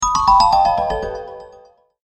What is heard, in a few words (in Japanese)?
チェレスタグリッサンド